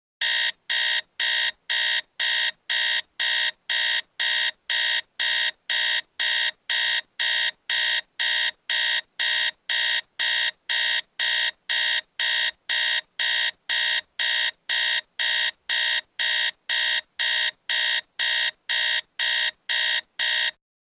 Clock, Alarm Electronic Clock Alarm Buzzing

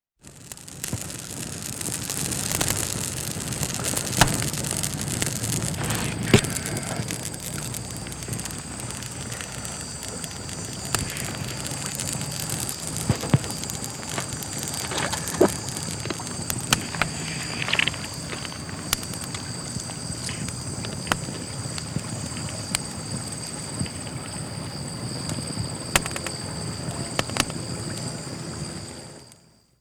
Fire Campfire Small; Electa Quinney

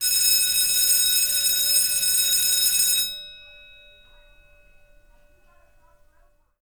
Bell, School, Ring, Voices, Dist; Poetry Project

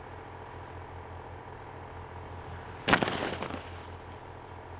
landing; EAU CLAIRE HTS; THOMAS NAUNAS

